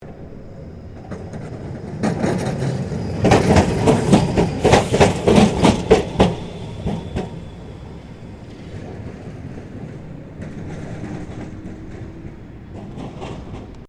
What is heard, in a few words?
Tram Melbourne W Class Crossing Points; Various Melbourne trams; Streetcars (Sound Effects:Vehicles); SoundEffects-Foundation4